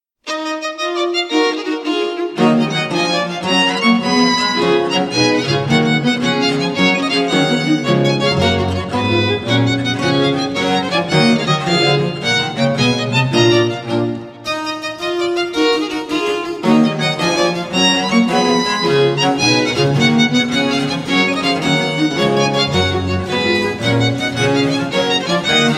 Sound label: Gigue (Prestissimo), spoken; Arien (C 52); Simplicissimus Ensemble